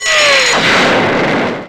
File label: WALK; Jet Rush & Explosion